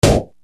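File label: RX7 PROC snare drum 3, Yamaha RX-7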